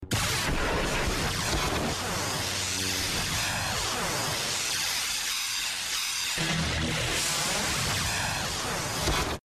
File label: Force Lightning; Sith